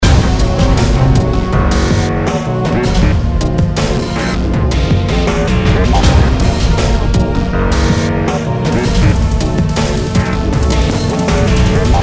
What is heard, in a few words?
loop